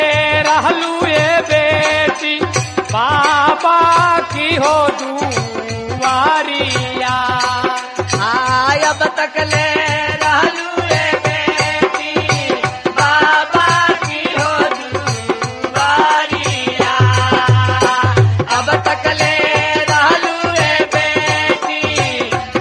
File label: Shiv Vivah Geet Bhojpuri